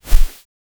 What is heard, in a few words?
the sound of a synthetic, game